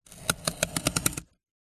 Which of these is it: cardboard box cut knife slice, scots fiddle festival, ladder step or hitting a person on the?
cardboard box cut knife slice